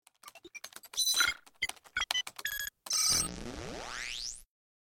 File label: terminal access